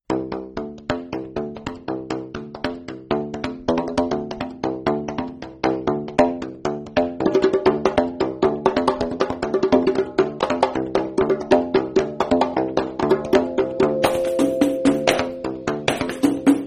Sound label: donkey beat